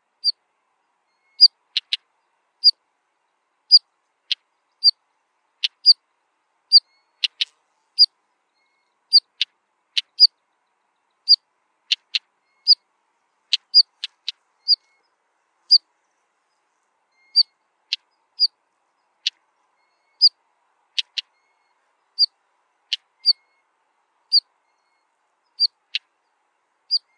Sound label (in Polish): BIALORZYTKA, birdsounds